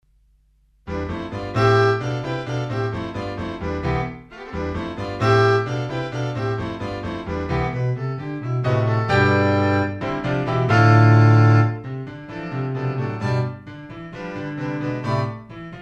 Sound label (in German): Quintett